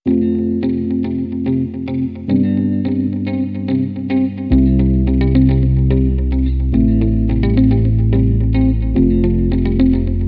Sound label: Acoustic Inspiration No Drums